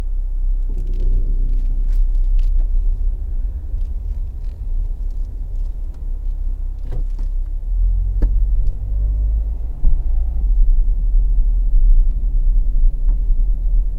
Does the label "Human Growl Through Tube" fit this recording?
No